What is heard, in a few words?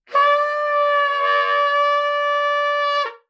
trumpet